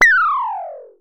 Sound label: Synare Filter; Star Instruments Synare 3